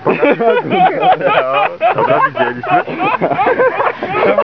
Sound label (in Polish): smiech; cstrike